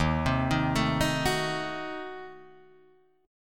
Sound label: Eb Diminished 7th Eb, Guitar Drop D